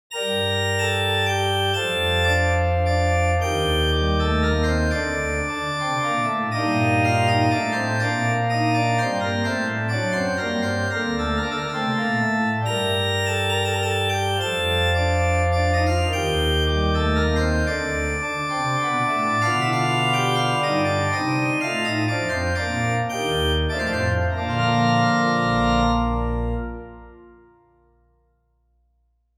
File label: Church Organ A, Minuetto in g minor, reverberated; J.S. Bach - Minuetto in g minor; Andreas Instrument Sounds AB; Church Organ A - Reverberated Demo